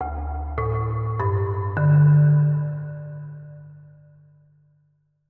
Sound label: marimba; Ice Age Scrat's Nutty Adventure